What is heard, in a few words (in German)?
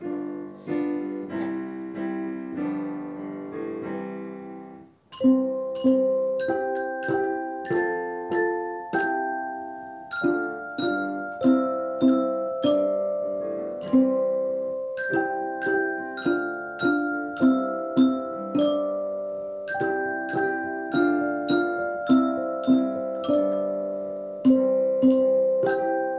OGS Chor Schulchor Instrumentalkreis; Morgen kommt der Weihnachtsmann